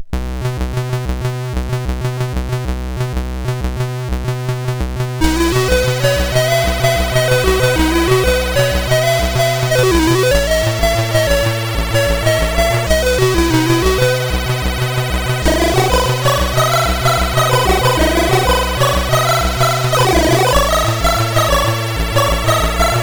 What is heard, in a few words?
sid MOS6581R4; Jahangir Khan World Championship Squash